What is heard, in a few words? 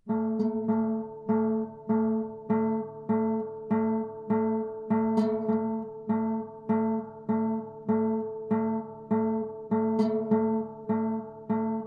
Cuban Landscape with Rumba; Brouwer